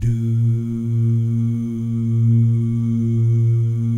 DOO GUYS, Vocal Jazz FX; Spectrasonics; Vocal Planet; JAZZ COMBO

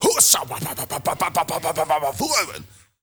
MALE RAV, MALE NOISE, Heart of Africa

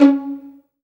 Pizz C#3, STRING; Best Service Gigapack 1; STRING 2

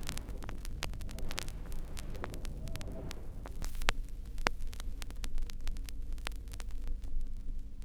Crackle, Vinyl Noise

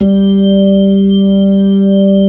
B-3, Brite; Brite Xfade

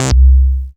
A BASS; CMP - Analogon

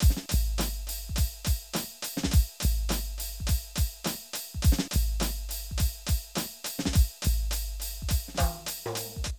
Drum loops, Hip-hop; Zero-G Groove Construction (1993)

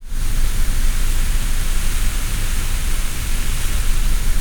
PINK NOISE, VOCAL WIND; ILIO; Vocal Planet; Jazz & FX

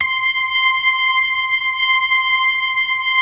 DRAWBAR VIB, Synth and Organ

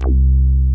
HOUSE BASS; Masterbits; Dance Trax

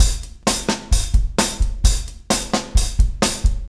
Motown, R&B Drums; Legacy; Drummers of Motown

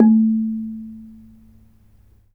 RANAT THUN A3; Spectrasonics; Heart of Asia

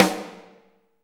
SD Real, Roland; Sound & Vision - Gigapack I

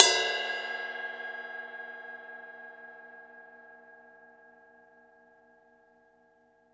RIDE, Drums Percussion; CMB Kit 1

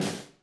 SNR, DRUM ZONE; Masterbits - Total Zone

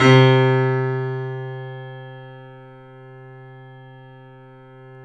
The Piano; InVision Interactive - The Piano

